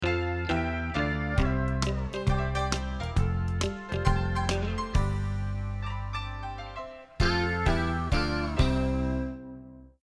country tail

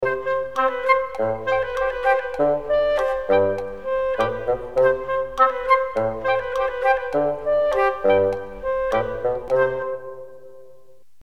happy woodwind

